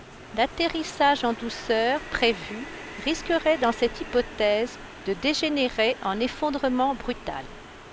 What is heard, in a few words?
subway